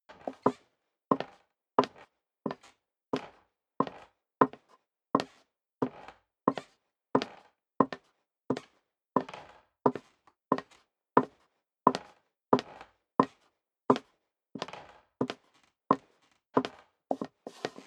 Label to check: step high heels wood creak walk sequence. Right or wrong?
Right